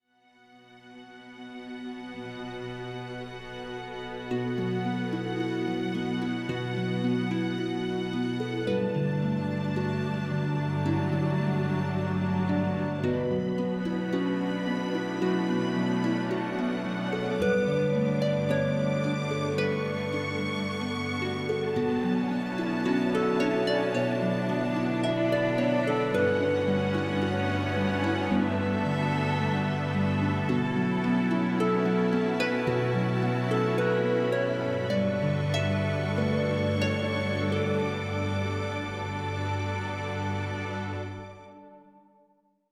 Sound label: Ambient Pretty; rwby grimm eclipse